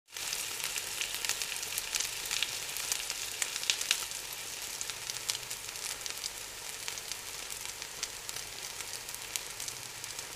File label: sizzling frying pan; casa